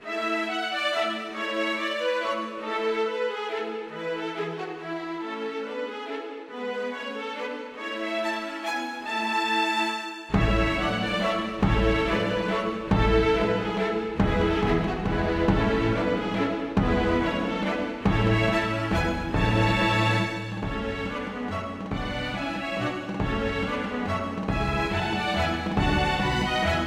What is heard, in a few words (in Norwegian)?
Menuet for strykekvintett med ad lib trommer, m trommer